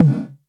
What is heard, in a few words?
tom sample e key, Royality free tom tuned to the E note. Loudest frequency: 217Hz; tom drum samples